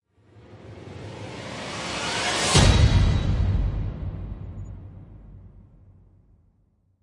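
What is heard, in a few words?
Hit Swoosh Spike; Brand X Music; Brand X Music Catalogue - Editor's Essentials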